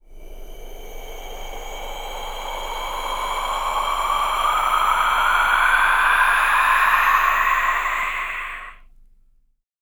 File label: WIND COLD, VOCAL WIND; ILIO; Vocal Planet; Jazz & FX